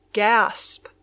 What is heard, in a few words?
gasp